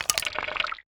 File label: Crafting UI Tab Button